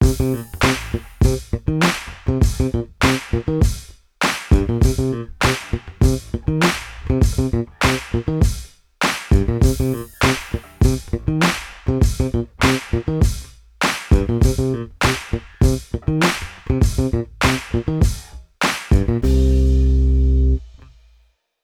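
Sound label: short video bass guitar short soundtrack; vlog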